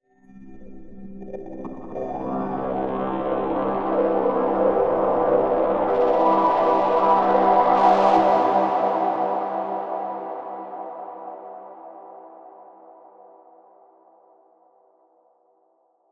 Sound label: Synthetic Swell